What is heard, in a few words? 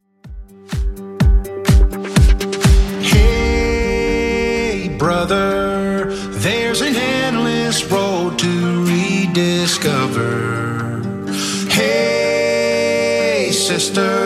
2ks Redrum 125bpm